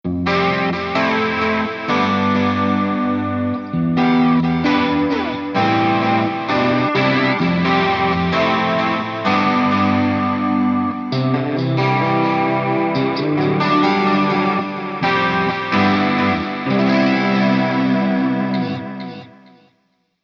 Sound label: System Comp Mod Delay Reverb; Gemini Greeen